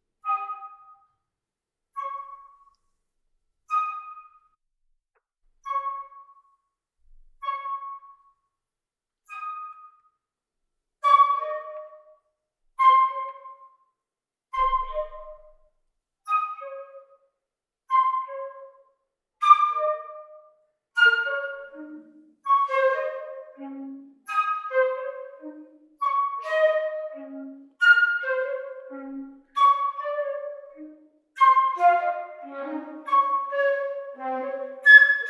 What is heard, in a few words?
dripping tap canon; noise reduction